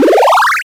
SFX Item

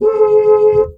Panel Steering Honk; Among Us

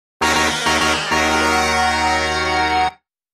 Miss

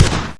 fire makarov

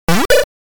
Opening a Gate (SFX)

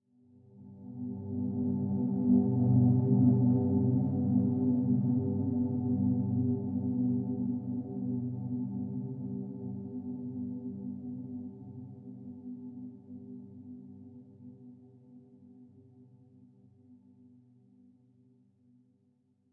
Swell Tone; HDD Theme Scull Swell Tone; inside

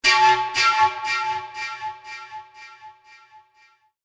panflute; crysis warhead

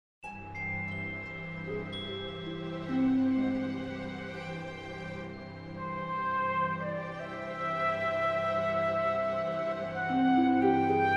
Strategy Ambience; Master of Orion

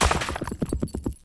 propel break

